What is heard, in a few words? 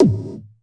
DEEP GT PERC, effected drum; Crypton - DrumBase5000